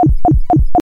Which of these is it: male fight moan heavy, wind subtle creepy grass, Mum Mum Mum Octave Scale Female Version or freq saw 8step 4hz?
freq saw 8step 4hz